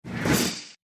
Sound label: swoosh crash